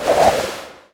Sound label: ensnare cast; weapons; dota; naga siren